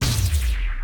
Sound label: Deflector Shield